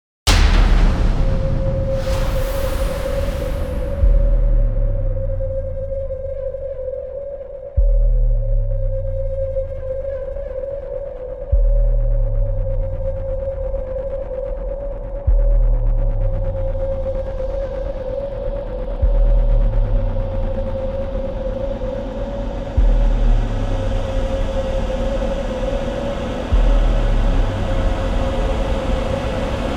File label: Riser